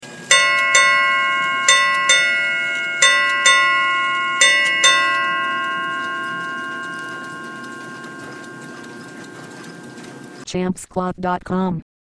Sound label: chelsea ships bell